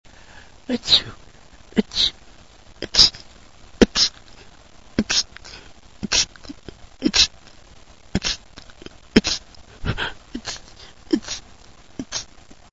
sudden sneezes, male

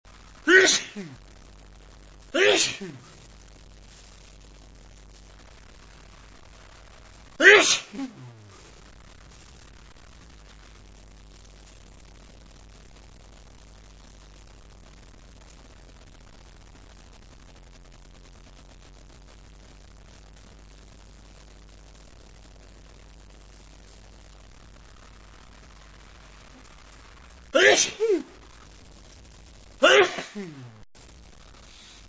cold, male

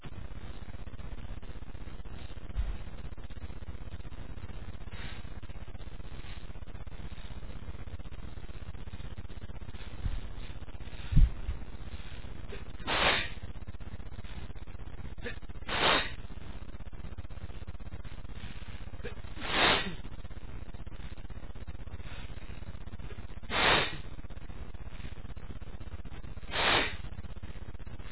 freshly cut grass, male, achoo

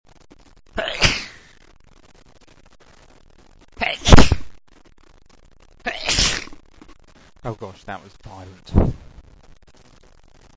violent sneezes, male

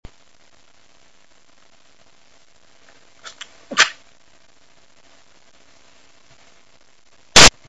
one stifle, male

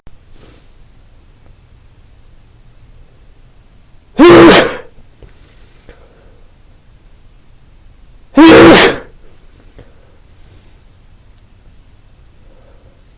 loud and wet, male

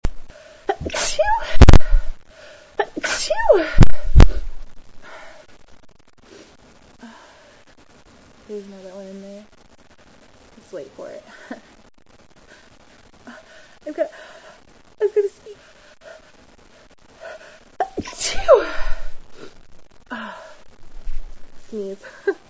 gonna sneeze, female

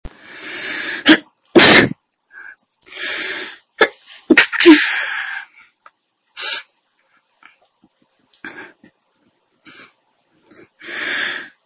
new dusting, female

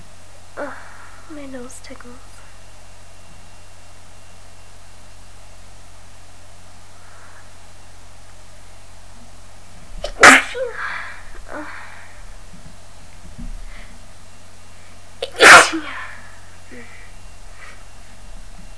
quick tickle, female

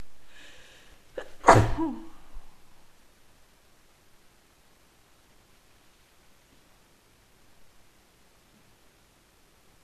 cold sneeze that hurt, female

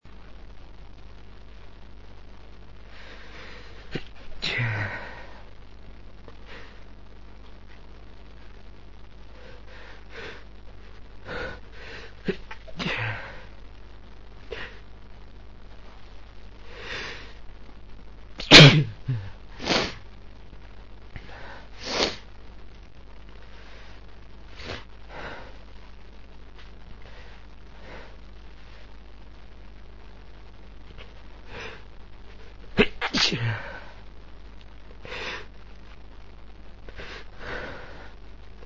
stifle attempt, male

